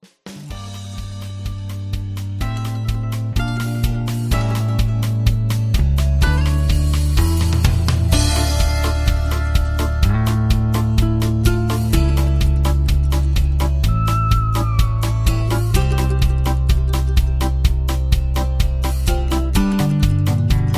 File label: Instrumental